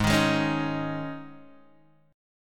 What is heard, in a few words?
Ab Suspended 4th, Guitar Half Step Down